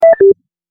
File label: Interface beep; Sound FX